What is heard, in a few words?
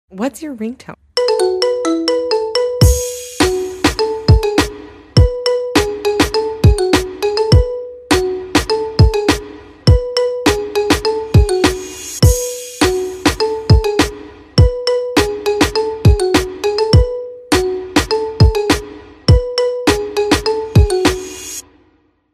marimba remix ringtone